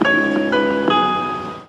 Jingle

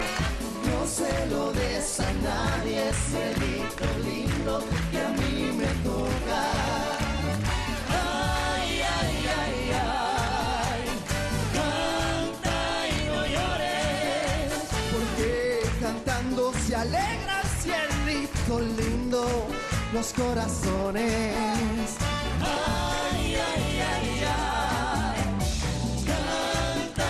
Tambora

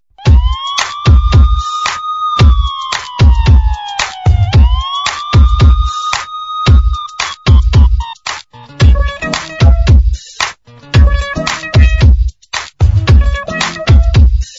Police Punjabi Mix

